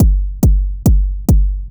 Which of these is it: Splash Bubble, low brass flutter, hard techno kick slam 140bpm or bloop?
hard techno kick slam 140bpm